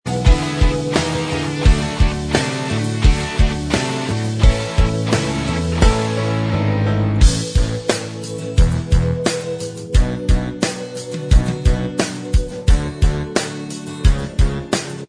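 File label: instrumental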